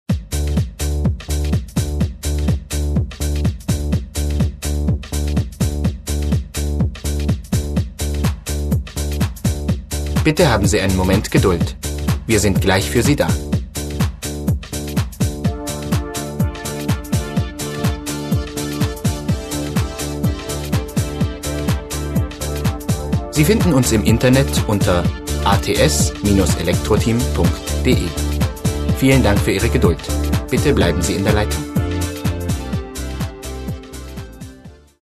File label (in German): warteschleife; ats elektroteam